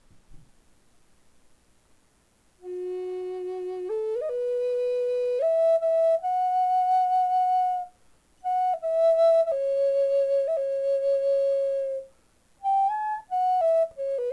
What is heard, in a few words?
Drown popular F sharp